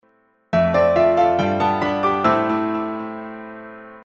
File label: Jingle